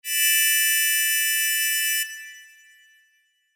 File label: Sho